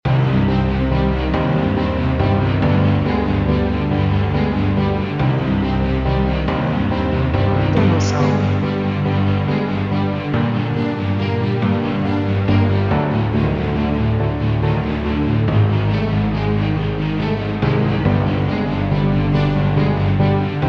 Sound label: Threatening Path of Sorrow Loop